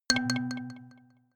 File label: notification kalimba delay low